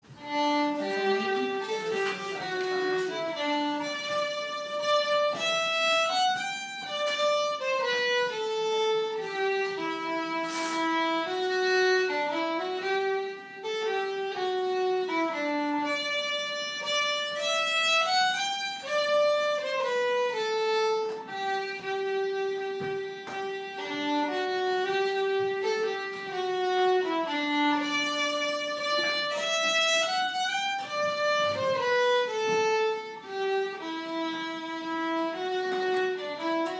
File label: G waltz